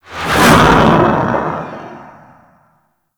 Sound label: Fighter Ship Close Short Fly By, Space Fighter Ship Engine; Warframe; RailJack; Enemy Ships; Grineer